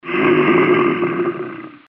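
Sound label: bear; medv1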